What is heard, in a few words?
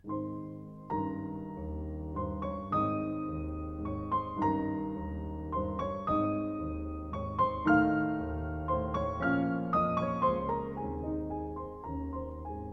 Landler in As-dur; Schubert